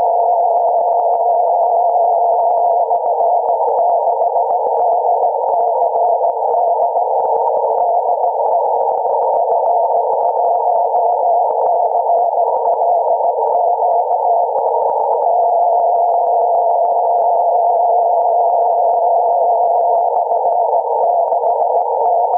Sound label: 122GHz CW; trafic